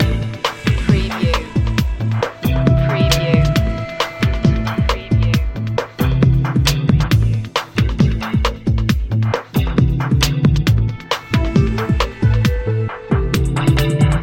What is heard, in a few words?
in a good mood loop